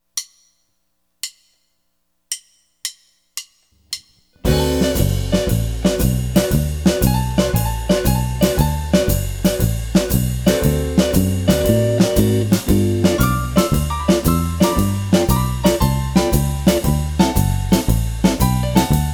Texas Swing in D; Blues

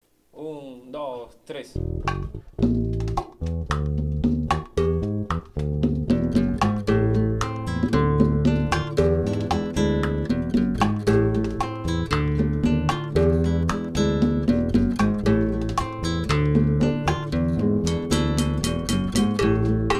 Guajira; cubana

